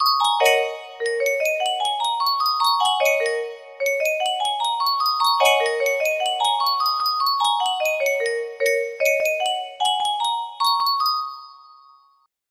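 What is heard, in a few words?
music box melody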